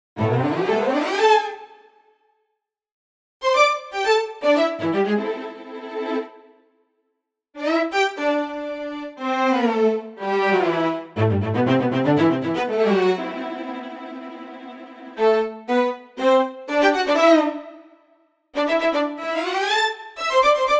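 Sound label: discothèque strings solo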